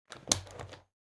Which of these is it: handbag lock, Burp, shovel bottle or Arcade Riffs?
handbag lock